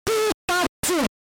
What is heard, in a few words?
Pikachu SFX; RBY